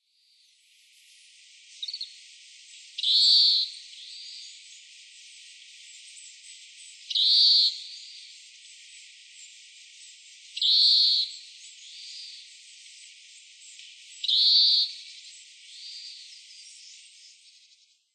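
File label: Oriental Greenfinch; kawarahiwa; utsunomiya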